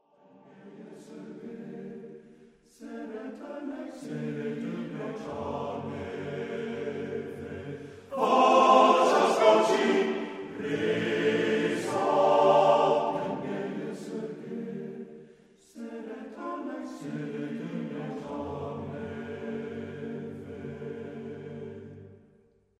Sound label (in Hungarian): Magyar nepdal